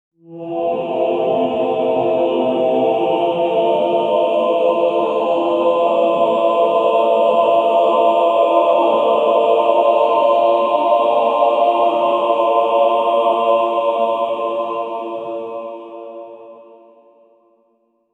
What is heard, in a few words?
chanting of a choir made; preparing